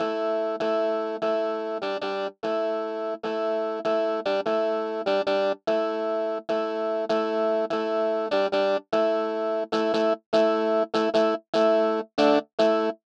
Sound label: keys